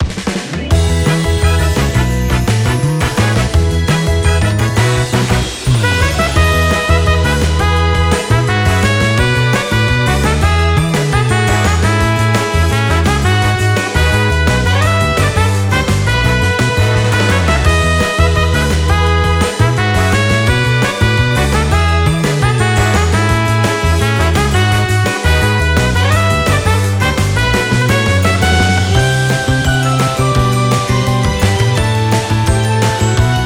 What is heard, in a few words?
Radiant Sunshine loop, BGM